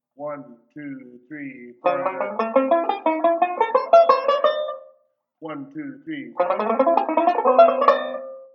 ii V Pattern, banjo

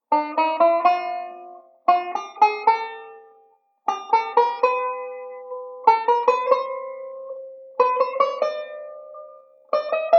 ii V 1st String Notes, banjo; Beyond Chord Melody Volume II

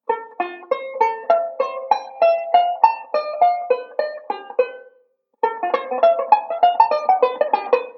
ii V Pattern, banjo; Beyond Chord Melody Volume II

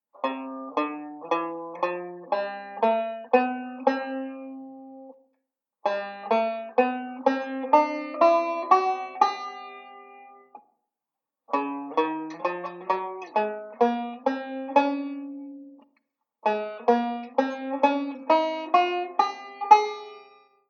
Open Closed Scales; Beyond Chord Melody Volume II

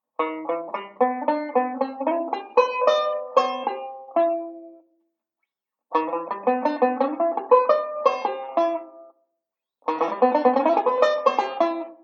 Octave Arp, banjo; Beyond Chord Melody Volume II

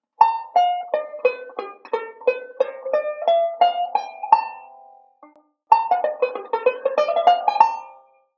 Modal Sequence, banjo; Beyond Chord Melody Volume II

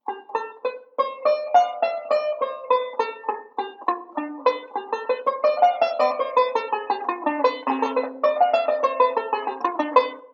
ii V Speed Drill, banjo

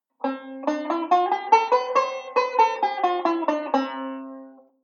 Delay Scale, banjo; Beyond Chord Melody Volume II

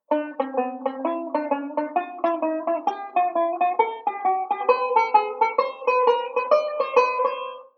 Enclosure Scale, banjo; Beyond Chord Melody Volume II

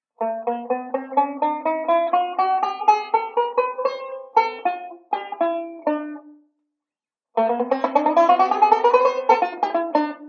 Chromatic Run, chord melody; Beyond Chord Melody Volume II

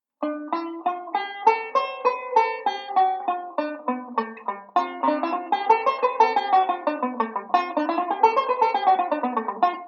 ii V Speed Drill, banjo; Beyond Chord Melody Volume II

